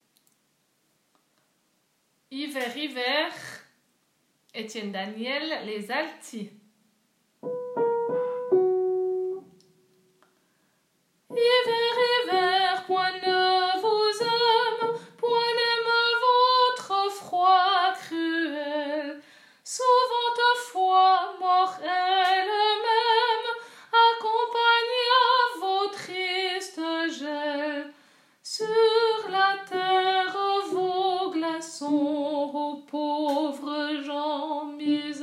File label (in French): Hiver Hiver Alto